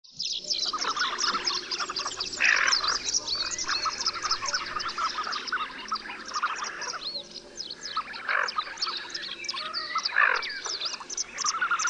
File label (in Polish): czernica, birdsounds